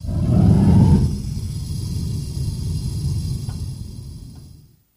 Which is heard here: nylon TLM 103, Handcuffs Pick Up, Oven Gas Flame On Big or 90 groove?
Oven Gas Flame On Big